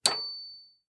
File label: Button Portrait Attention